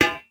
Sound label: ui drop metal bars; Rust